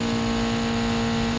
Neon Buzz Loop; buzz & hums

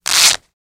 Cloth Rip Single

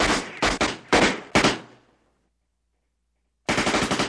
Gun Fight Left Shot

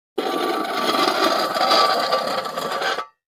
Coffee Can Scrape On; barrels buckets & cans

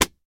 Book Slam Slap Book

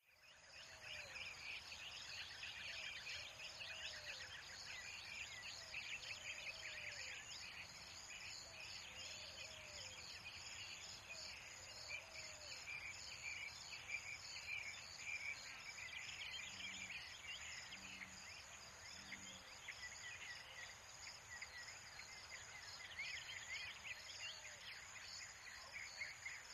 Western Nevada Meado, country

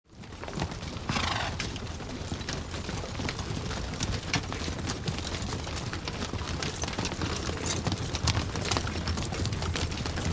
Horses By Arab Army Pa

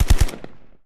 LIGHT MACHINE GUN BURST; bursts

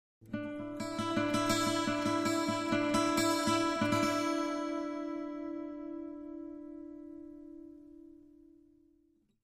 Acoustic Guitar High Ton

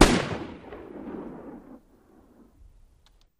Gun Shot Sawed Off

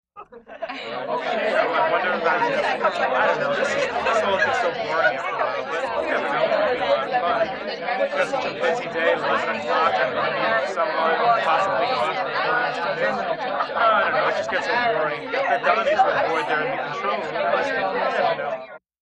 Party Chatter

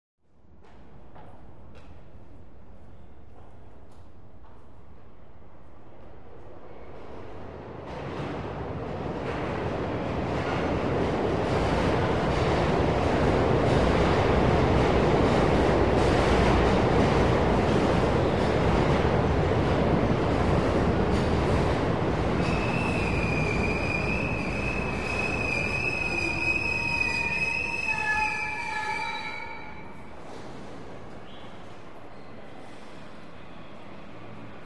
Subway Plat Train In, subway

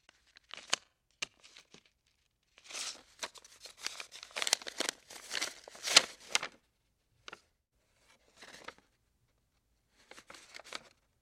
Paper Handling Movem; paper mvmt

